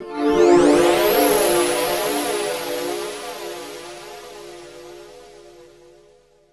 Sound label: Guitar Textures